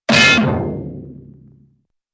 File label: safe clang and thud